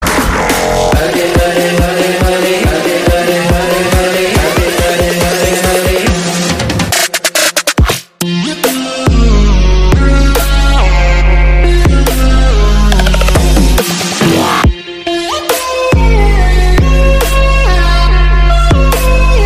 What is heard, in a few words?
Shankh Urban Trap